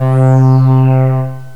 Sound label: Halo Pad, Synth pad